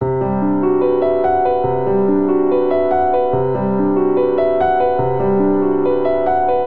Ostinato Modal C Lydian 72 bpm, Grand Piano; MIDI Clips; Ableton

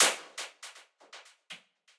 Noise Delay, Percussive

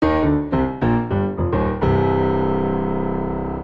Ending Walkdown C 132bpm, Grand Piano; MIDI Clips; Ableton

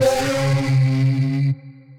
Reverse Airy, Synth Lead

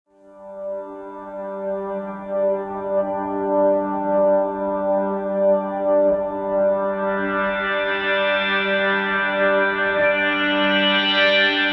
Drone G 80 Bpm; MIDI Clips; Instruments; Olivine; Ableton; Drone Lab

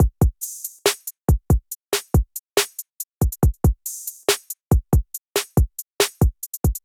Kit, Trap Drums; Opulent Kit; Ableton; Factory Packs; Trap Drums by Sound Oracle

